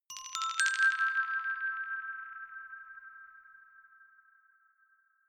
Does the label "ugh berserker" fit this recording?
No